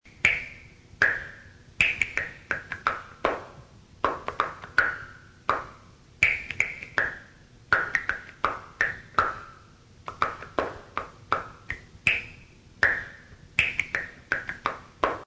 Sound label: finger music